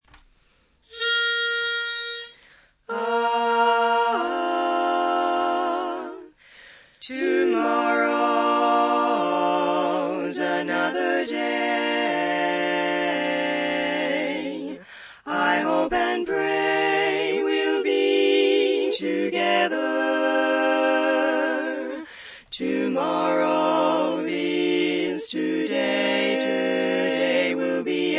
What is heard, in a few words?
4 parts